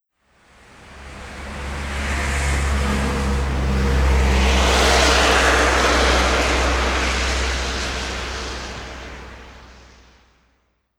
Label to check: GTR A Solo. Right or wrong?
Wrong